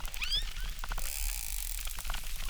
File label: Chirp zap, acquarium